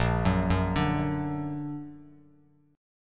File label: A sharp Augmented, Bass Standard; A#+ arpeggiated; JGuitar